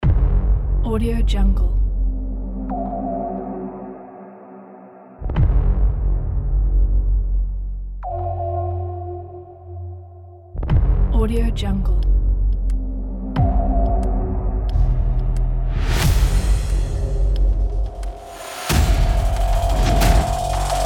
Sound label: blockbuster action hybrid trailer